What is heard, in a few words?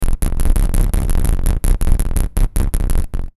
Stutter Choppy Static Distortion